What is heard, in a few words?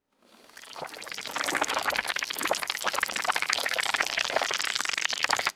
thick slimy liquid bubbling swell; liquid-mud